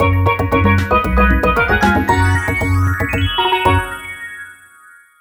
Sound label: (Jingle) Get Medal